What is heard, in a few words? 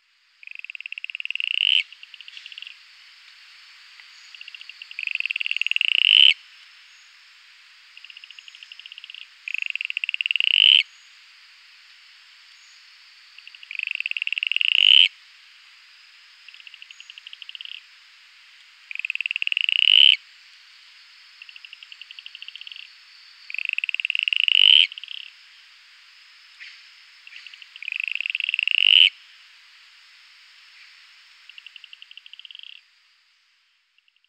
Atelopus flavescens